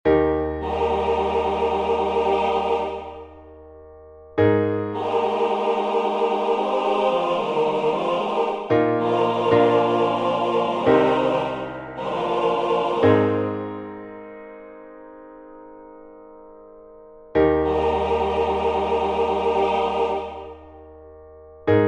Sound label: MIDI SATB